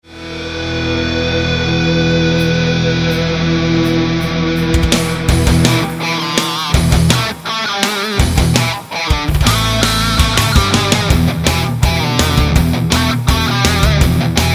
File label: guitar Rock; Battle Toads